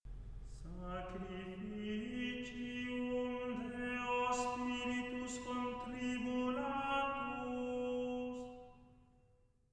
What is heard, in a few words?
Gregorian Chant; Miserere; Psalm 50; Sacrificium Deo spiritus